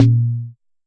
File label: Jomox MBrane, drum machines; Jomox MBrane 11